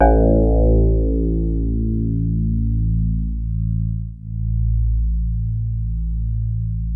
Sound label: DX7 HARD A1, E-PIANO; Music Store